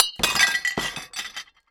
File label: Smash